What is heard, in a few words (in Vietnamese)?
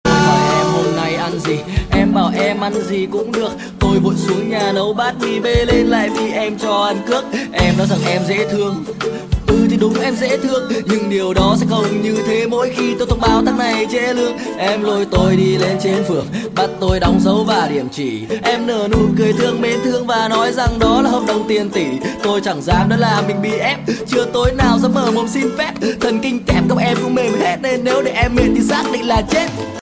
rap